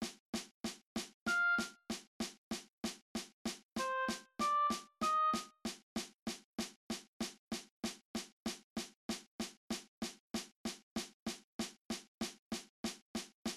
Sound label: Just a Closer Walk cut time oboe; senior high band